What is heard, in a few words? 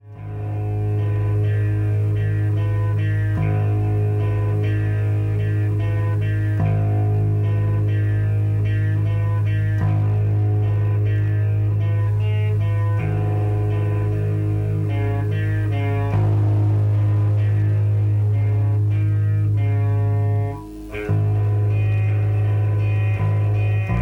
Cathedral